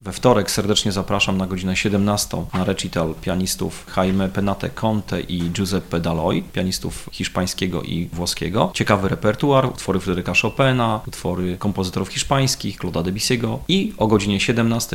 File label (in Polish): pianisci